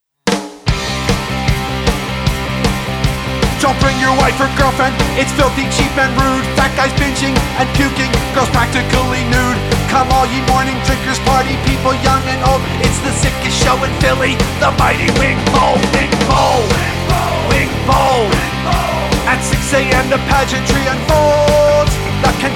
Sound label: Gtr; Wing Bowl